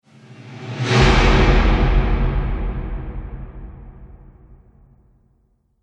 Cinematic Impact; Miscellaneous Impact Sound Effects

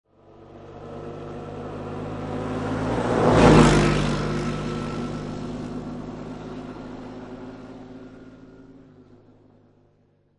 Quad Bike Drive By Center; Motorbike, motorcycle type Quadbike

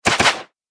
Archery Two Quick Shots, bow and arrow

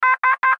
Radio Morse Code; Morse Code Letter O; Electronics; Warfare; Military

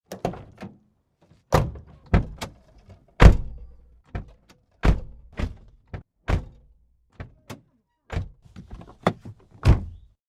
Car Doors, Car Doors - various open and close; Cars; Vehicles; Tom Hutchings

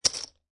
Poker Chips Dropping On Poker Chips; Poker, gambling, chip; clicking

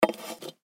Machete Set Down Wood Surface; Knife; Knives; Combat; Warfare

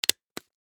Hole Punch Paper; Office

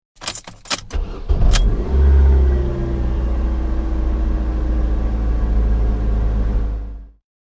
Sound of starting a Ford Focus car.; Cars; Vehicles

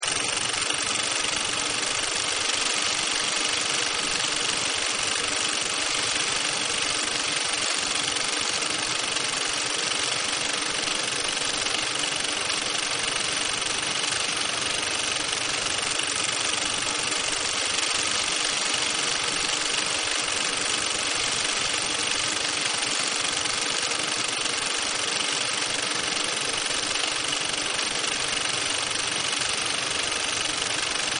Film Projector Mono; Projectors; Offices

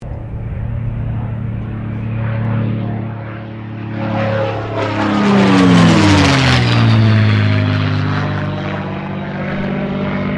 AIRCRAFT CAC CA-18 MUSTANG MK23 PASS OVERHEAD; CA-18 /P-51 Mustang; Airplanes; Vehicles; Aircraft Collection; Twistedtracks

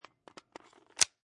Pistol Semi Auto Clip Inserted Full; Pistol: Semi Auto, Clip inserted, full. Version 1; Gun Handling Sound Effects; Lynne Music

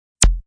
Lazer Sound; Laser Sound

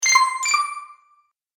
Magic Purchase Delay Ding; Cartoon Sound Design Sound Effects

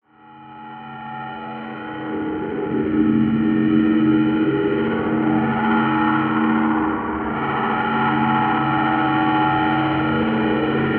Drone From The Darkside, drone sound effects; horror

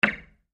Impact Metal Steel Beam Hit; Metal (Sound Effects:Materials); Blastwave

